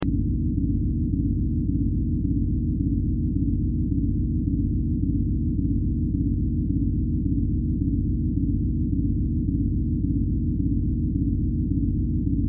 Sci Fi Control Room Low Rumble Drone Flutter Hum Electrical